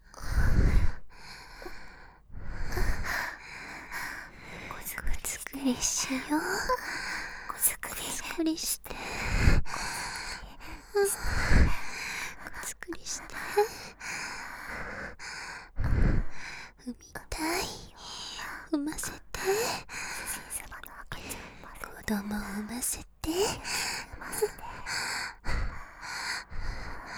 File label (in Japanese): 喘ぎ; 深雪